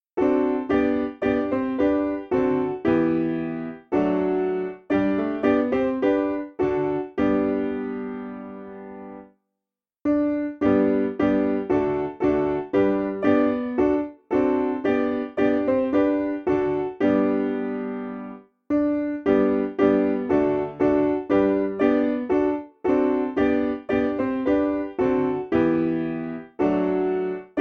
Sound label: Piano